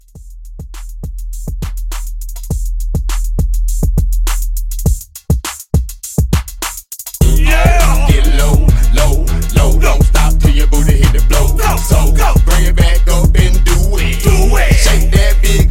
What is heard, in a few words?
Hype 102